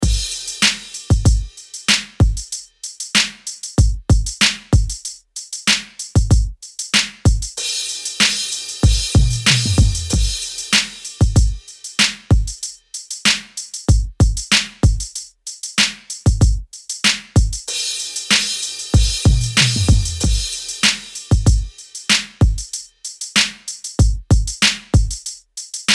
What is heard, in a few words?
rap beat; 1st period egypt